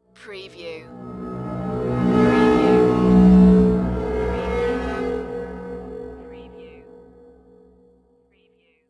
Reverse Synth Stab

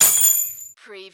Button Sound - Metal Pegs Drop

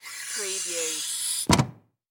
Door Open Hydraulics

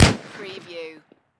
Rifle Muzzle Loader

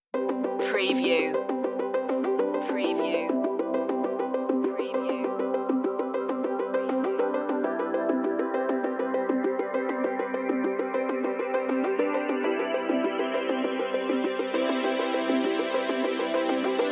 electric life no drums or sound fx

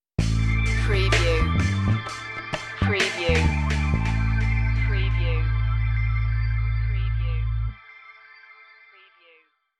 slowboat stinger

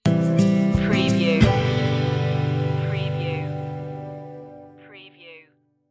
tap your knees stinger

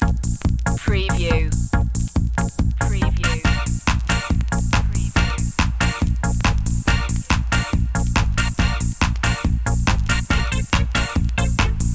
short vintage dance cue loop long

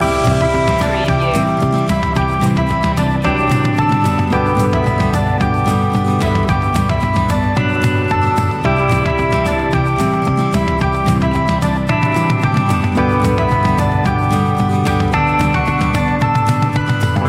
that folky feeling loop

